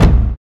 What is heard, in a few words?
Shot Blunt; FREE SFX PACK VOL 2